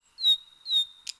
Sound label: Draw Raytrace; the princess and the frog; Orleans